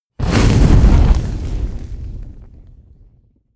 burst

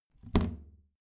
plastic trash can close heavy

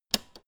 old button press jump out fast

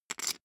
poker chip stack small rattle

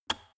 plastic water boiler turn switch off

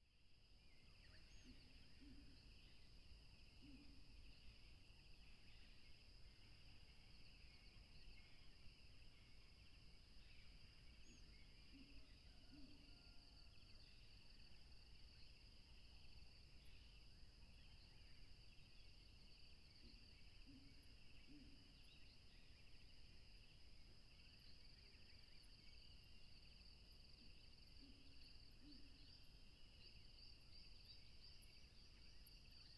tropical river crickets plane

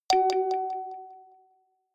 notification kalimba delay high

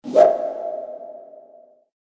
fight swish ambient

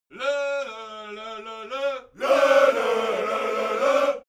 army song segment

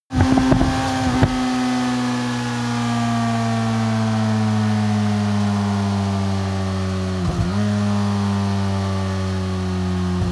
motorcycle sport bike deceleration

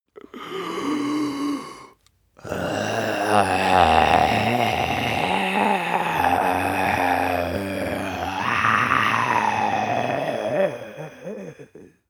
zombie male growl inhale exhale throat

